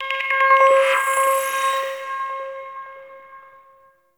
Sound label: Class Lesson Slide; Warframe; The New War; Zariman Class Quiz